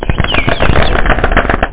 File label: cheer; Bible Fun Pack